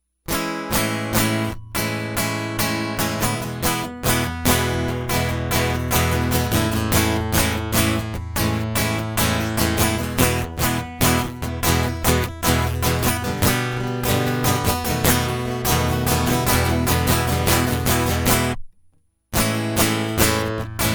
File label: Guitar Loop; Money back Guarantee; Charons Obol